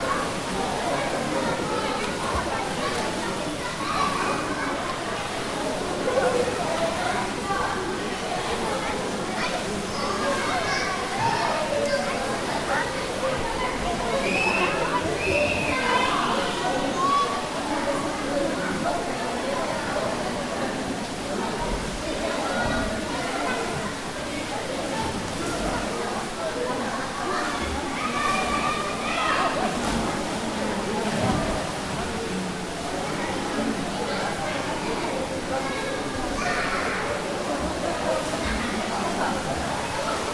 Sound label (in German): schwimmbad towards bigpool childrenpool in back